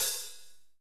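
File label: hc open hh, hardcore open hi-hat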